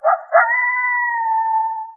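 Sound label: rural random coyote; random amb sfx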